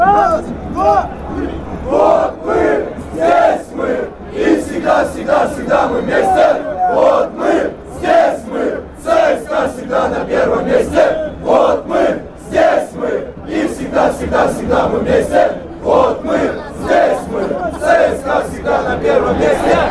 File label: cska chant